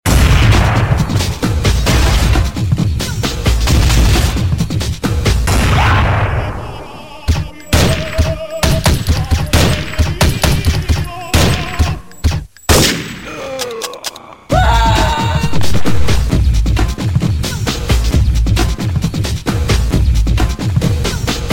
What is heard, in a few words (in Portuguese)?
guerra techno; toque de celular